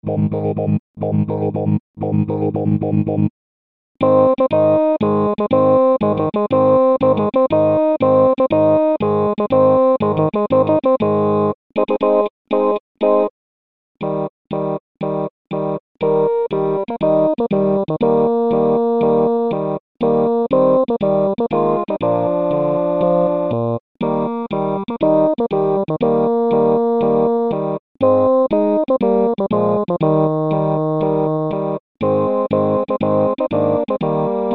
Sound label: Fanfare tutti